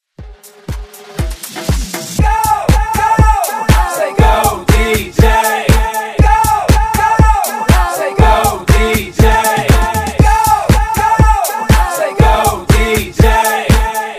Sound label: Dance Redrum